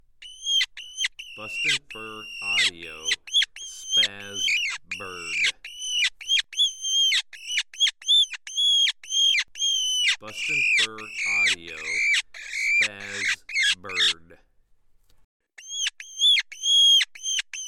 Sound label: Spazz Bird